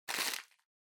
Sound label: Throw Streamers Noise